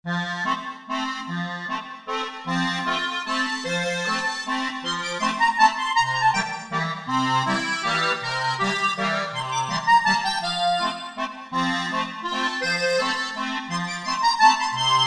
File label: Accordion